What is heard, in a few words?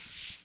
slide in; Infobase